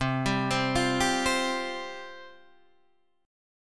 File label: C Suspended 4th C, Guitar, Half Step Down